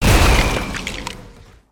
Gorilla Explosion

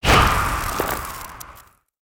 Ice Shuriken Throw; Spinjitsu

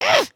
headspin, emotes

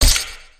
brick pickup, ui; aronwk collection; ndaudio

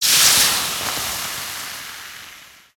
Steam Sizzle Acid Burn

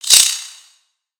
Spider Attack Ranged; Lego; creatures mechanical

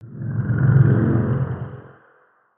Infection Swell

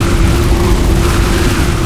Ice Tornado Mid Loop; Spinjitsu

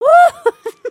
dance; emotes

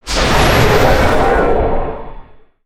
Vanquish Head glow; Sorcerer

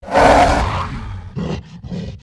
Gorilla Roar; build mode

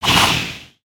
Spinjitsu Ice Shuriken Project

